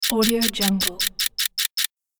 multimedia data readout

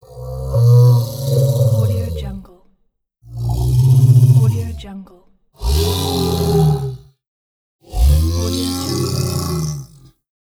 sad creature growls

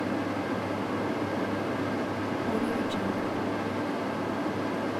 kitchen ventilation hood medium speed loop; stormwaveaudio

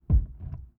robot footsteps; prosoundfx

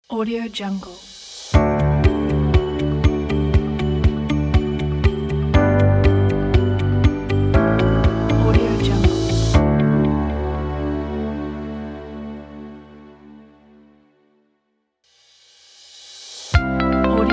corporate logo pack

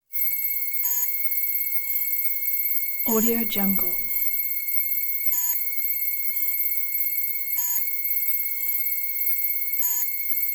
telemetry constant high pitch synth beeps

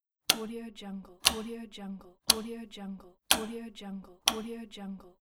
mechanical click

